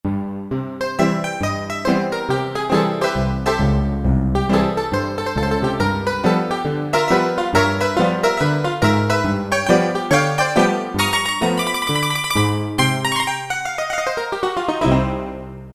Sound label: Honky-Tonk Piano, Roland PN-D50-04